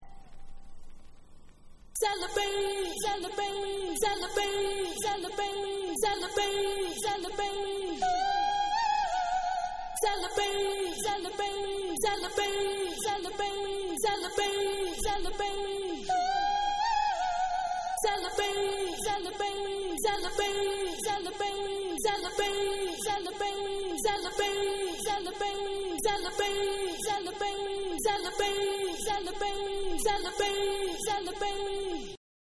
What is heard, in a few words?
Acappella